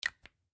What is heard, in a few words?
Household Cologne Replace Cap; Primping; Blastwave